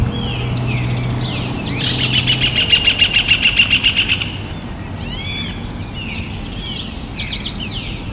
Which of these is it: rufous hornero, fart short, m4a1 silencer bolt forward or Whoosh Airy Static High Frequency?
rufous hornero